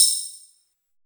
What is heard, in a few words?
SYNTHORINE, TECHNO; Drums In Your FACE!